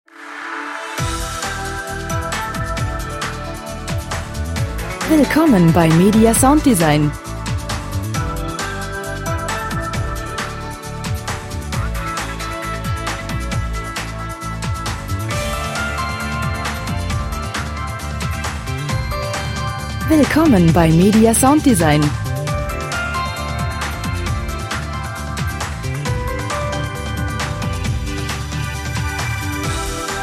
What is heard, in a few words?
percussion